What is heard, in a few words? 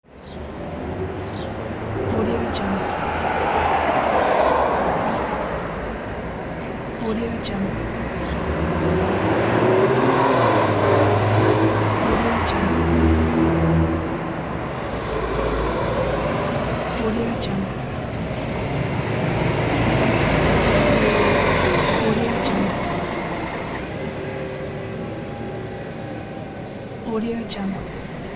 city ambience